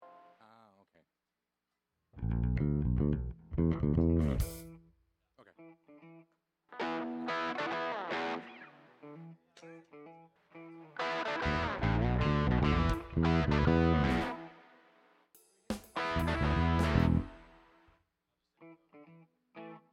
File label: Strings Mix; fine tuning